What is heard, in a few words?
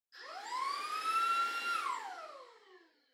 Vacuum Cleaner Far Vacuum High Short; 掃除機04-29(遠い バキューム 高 短)